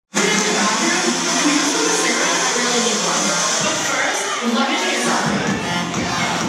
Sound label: graduation dance